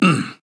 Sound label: Grunt Jump; Barik; Paladins